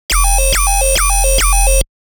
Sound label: bass